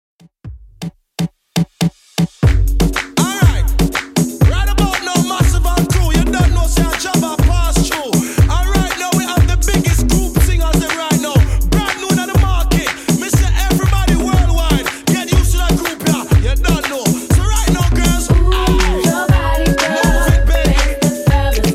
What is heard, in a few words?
Redrum 121bpm